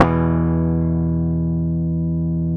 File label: E, Bass; Sampleheads; Will Lee Bass Library